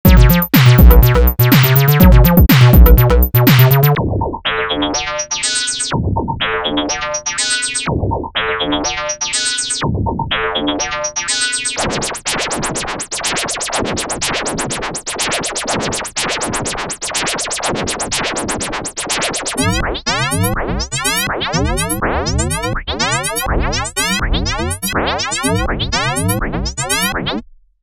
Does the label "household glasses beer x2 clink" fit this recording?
No